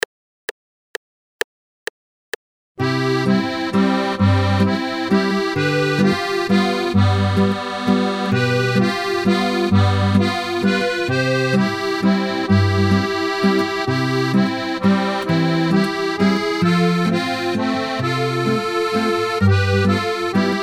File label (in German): Walzer